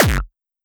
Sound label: dirty kick low